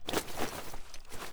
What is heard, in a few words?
javelin reload lift